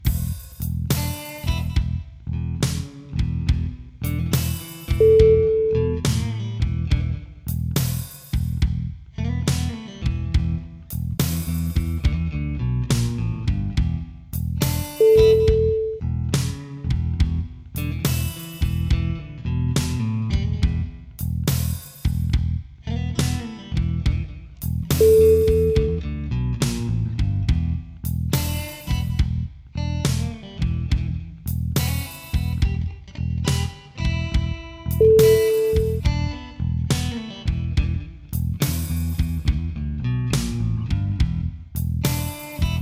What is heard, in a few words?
black cat blues